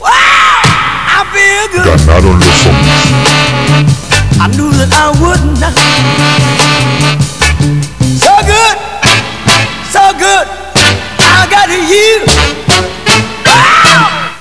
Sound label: win zombies; zombie escape